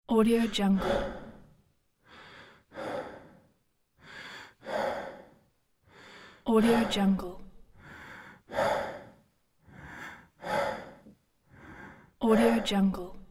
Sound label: male breath